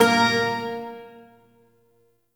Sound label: Shawzin Oct One A Sharp; Warframe; Duviri Shawzin